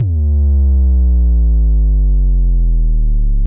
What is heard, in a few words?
sub drop